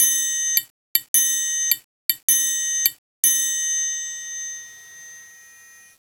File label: Triangle; kentei kyoukai imasara hito ni wa kikenai otona no joushiki ryoku training ds